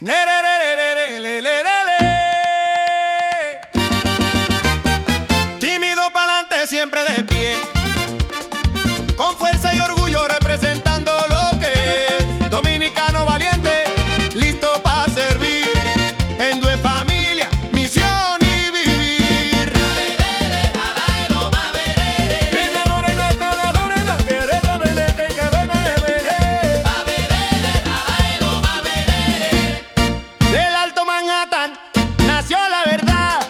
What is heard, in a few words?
Version Merengue